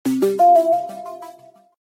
Bubble, notifications; LG

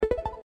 Send, Keytone sounds; KB620 Elbrus; LG; System sounds

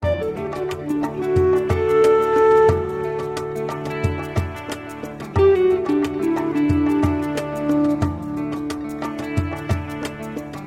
Flute walk; Micromax; Andro A60; ringtones

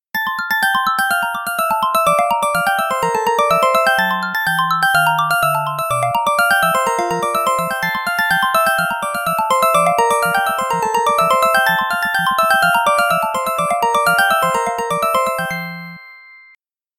2000s Phone, Retro; ringtone; Samsung Galaxy Z Fold 7; Sound Theme

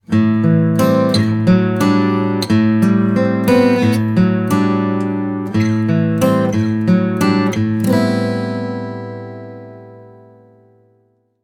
Acoustic, ringtones

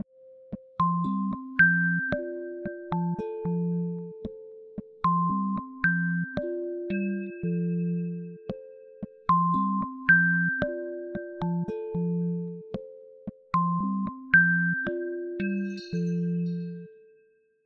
Night Chimes, ringtones; Samsung Galaxy S26 Ultra; Calm

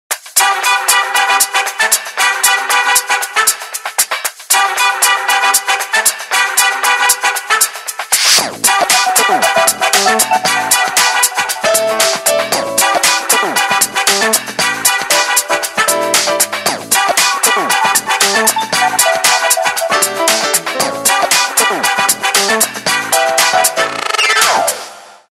Motorbike tour

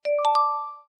Complete, notifications

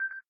Video Record, ui

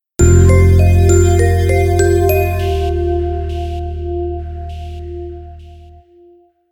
Crypto, notifications; Panasonic; Eluga X1

